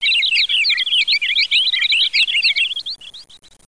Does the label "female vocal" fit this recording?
No